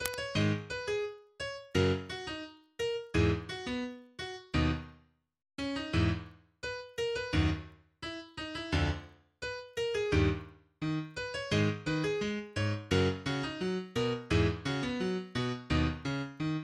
Piano

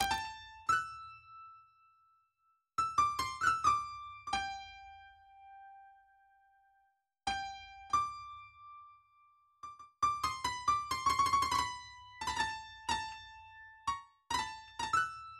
flute; Mdzs

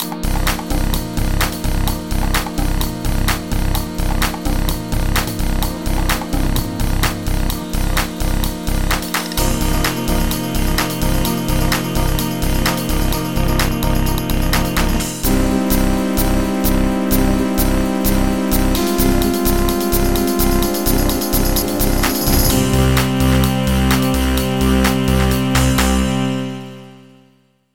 Trance

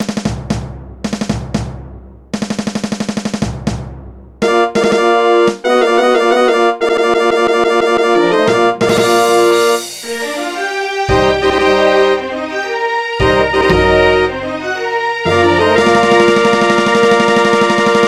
Fanfare; 20th Century Fox

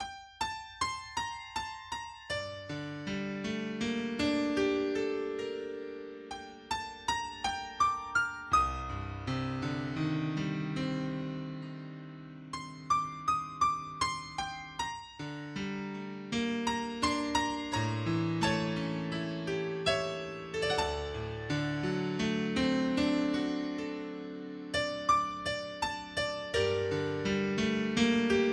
Sad Song

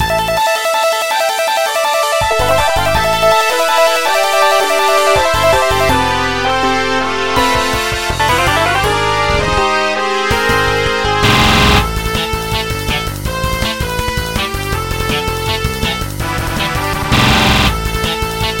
nail man MIDI

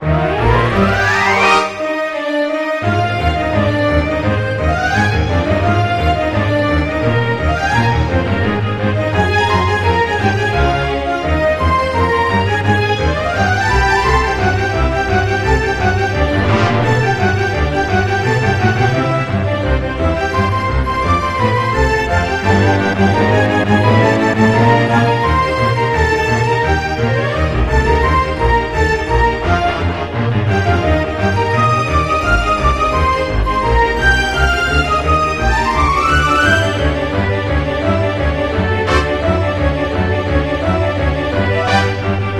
Orchestral WITH Ending String Runs Extra Notes

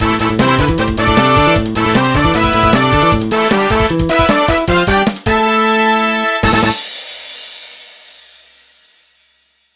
Game Over

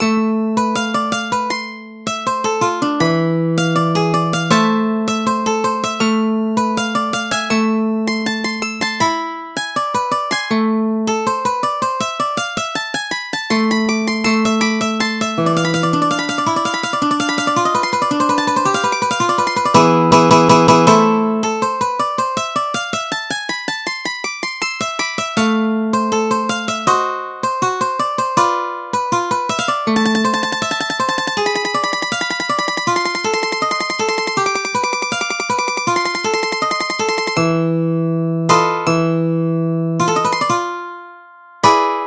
Guitar Fantasy

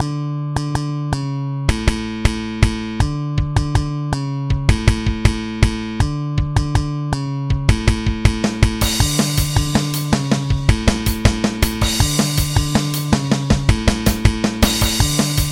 New Beat

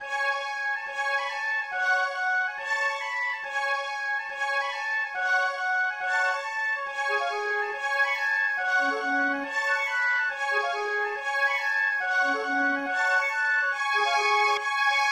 in C Sharp Minor

